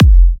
Kicks F; markus hakala

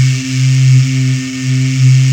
VOX C3; Big Fish Audio; Synth City

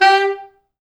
G3, Pop Brass; Best Service ProSamples vol.19; AKAI

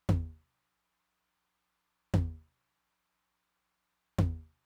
Mid Tom, Roland TR-909; Roland TR-909 Ableton Project

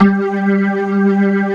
big organ

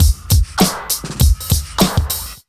100 BEAT, Pure Electro

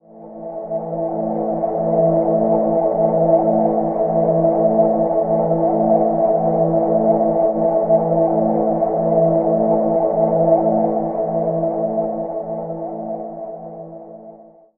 Fog Pad A, Ambient Pads; Zero-G Seismic Frequencies; 90 s Sample CDs

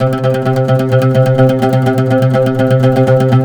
PLK OUD, Roland LA Composer

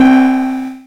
Electron Sidstation, Drum Machines

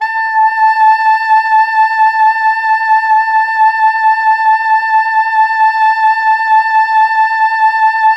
Pop Brass A4 R; Best Service ProSamples vol.19; AKAI

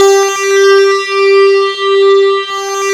MWSYNC C5; Best Service Dream Experience

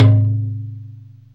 BAMBOO, BAMBOO GAMLAN; Zero-G Ambient 1

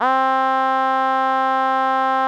700 C 4, Korg; 700S; Back In Time Records; Korg Universe VOL-1

